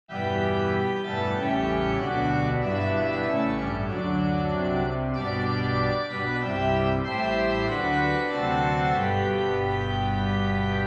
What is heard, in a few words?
See Amid The Winter Snow, Organ, 6 Verses